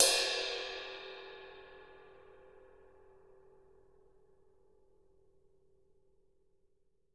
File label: ride